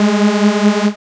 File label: BLAST Ab2, dubstep; Jack Sparrow; Deep Dubstep Progressions